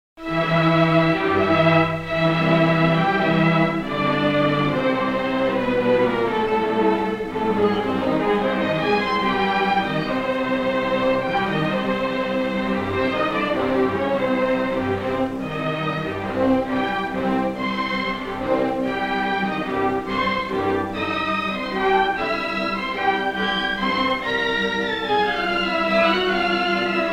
Octuor à cordes en ut majeur pour 4 violons 2 altos et 2 violoncelles; Très modéré; ENESCO; op. 7

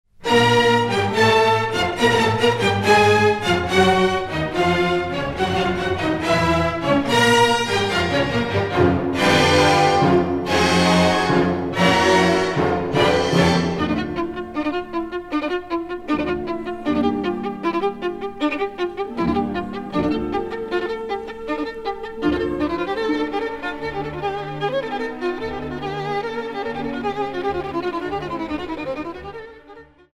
Concerto pour violon et orchestre en ré mineur Allegro con fermezza; KHATCHATURIAN

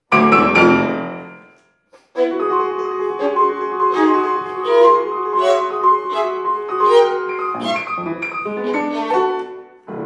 SONATE POUR VIOLON ET PIANO; MARTIN

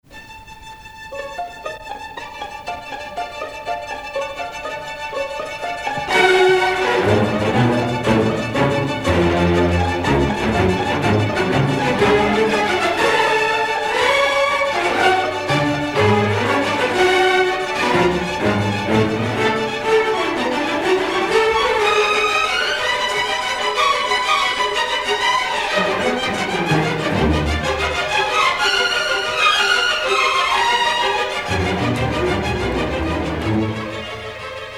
SYMPHONIE POUR CORDES; Presto; HONEGGER; Vivace non troppo

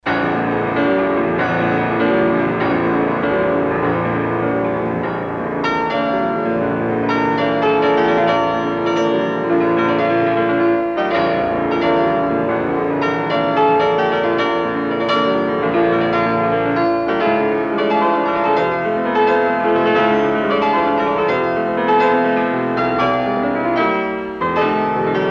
Prélude pour piano en si bémol majeur; Rachmaninov; op. 23

